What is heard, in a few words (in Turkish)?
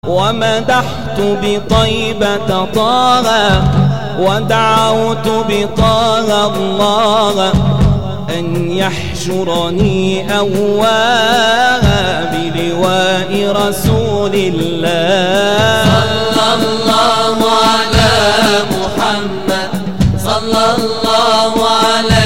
Islami zil sesleri